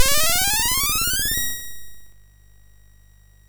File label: sid MOS6581R4, GAMES; Chinese Juggler